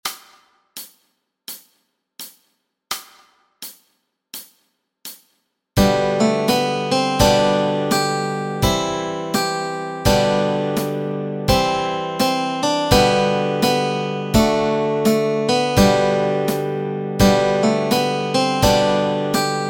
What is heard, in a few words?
Melody and Chords Track